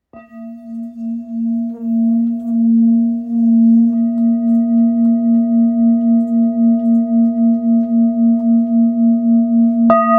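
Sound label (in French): Bol full moon la, bol chantant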